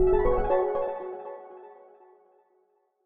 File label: Level Up Mission Complete Fantasy